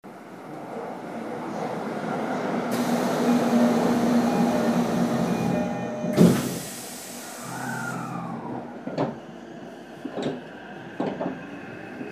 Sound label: vvvf, mitsubishi